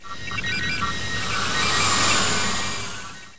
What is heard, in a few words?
Exit Windows; Giant Leap